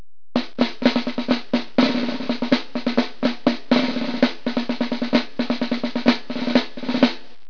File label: s drum